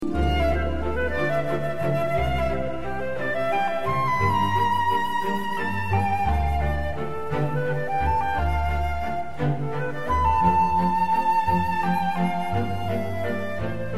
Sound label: flute